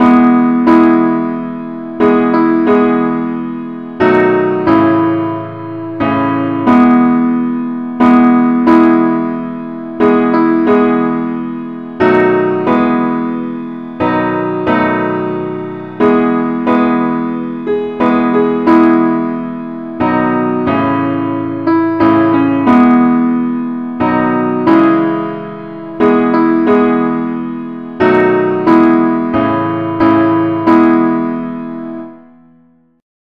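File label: simple accompaniment key e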